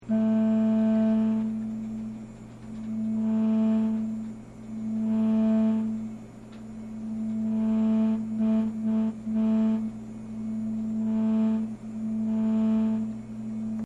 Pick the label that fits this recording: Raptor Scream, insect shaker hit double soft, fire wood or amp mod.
amp mod